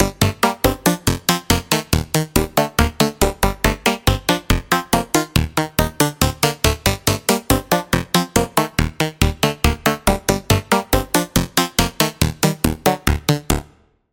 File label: Pluck; Routed Sound; Sylenth1 Expansion - Hyper Gamer Vol.1